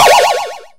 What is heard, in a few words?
jumpscare sting; Horror